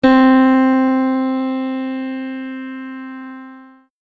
piano PSOLA TDIPSOLA

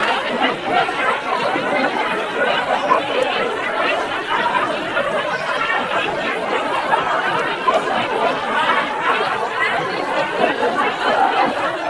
laughter

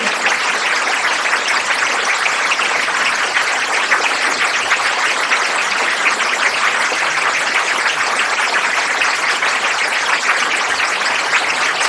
applause

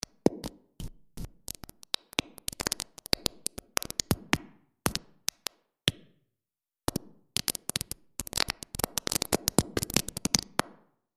Small room dark; .mp3 file - 100% wet; freeverb

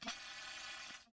clarinet diff

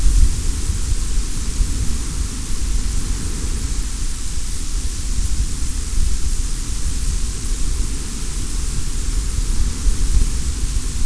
wind

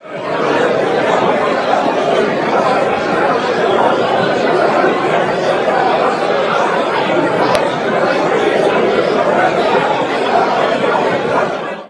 crowd synth

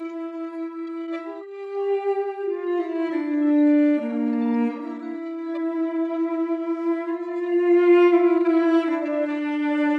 trio synth inst

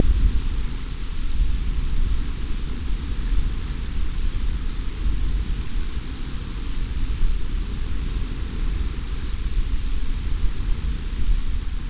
wind

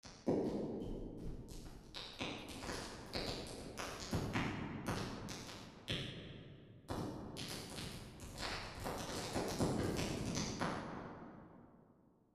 Concert Hall

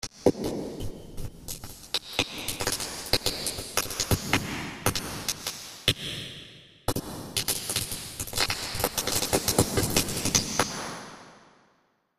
mid room 100 wet, reverbs; zroom

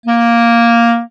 clarinet synt

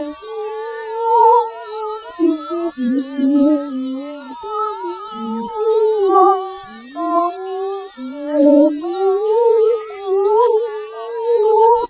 singing

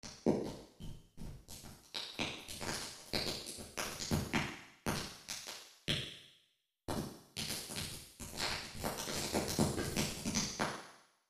Small Bright Room; realverb

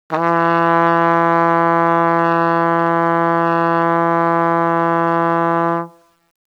trumpet

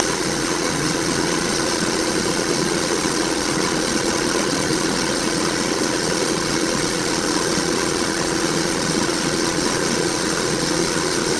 blender

